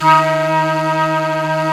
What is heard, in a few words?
Flute, Singin' Flute